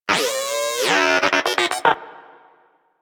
Moa Inquisitive Vocal Player Down; Warframe

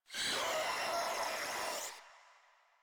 Infested Ancient Heavy Bark; Warframe; Proto Infested

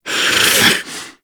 Growl; Good End; Eleanor; Warframe; Nineteen Ninety Nine; Quests; Hive Queen

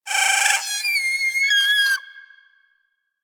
Player Lure Call; Deimos; Animal Lure; Avichaea

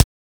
Weapon Punch SMG; Warframe; Weapons; Weapon Punch Layers

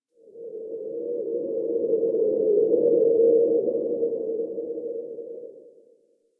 Forest Cave Howl; Ambience; Warframe; Grineer Forest

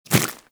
Small Walk Dirt, Footsteps; Warframe

